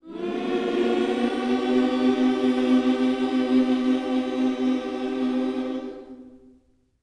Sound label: MINOR, Gospel; Vocal Planet Vol1 Gospel